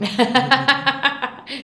VOX, Singin

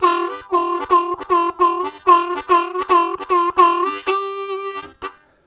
Harmonica; Zero G

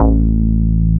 DR. LOOP, BASS; X-Static Goldmine 3

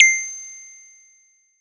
SY SQUEAK; ModelCycles

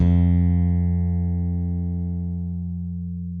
ALEMBIC BASS FING F2; Masterbits CLIMAX 7 - Bass

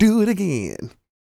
SING, Vocal Planet R&B & Dance; ILIO

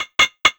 HH FX; Ragga & Dub Reggae; USB Soundscan vol.27; AKAI

